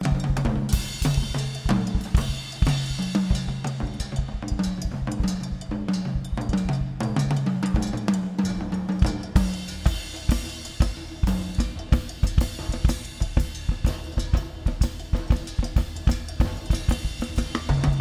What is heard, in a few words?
Drums